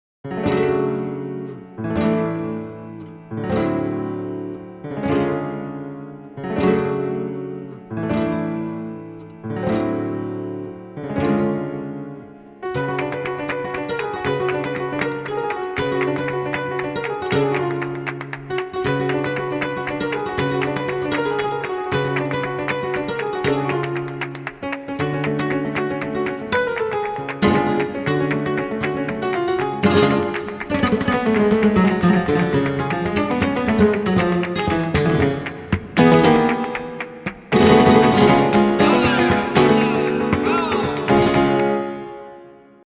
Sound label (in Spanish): Buleriando Midi